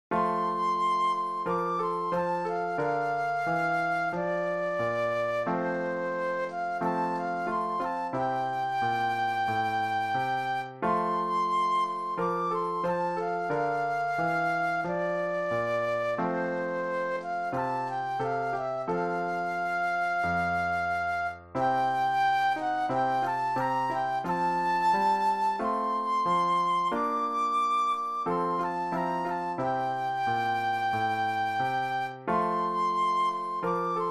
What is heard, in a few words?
Flute Solo; christian solos